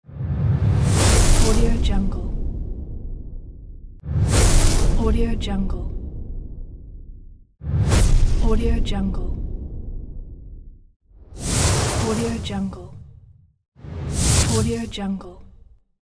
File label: fire ball whoosh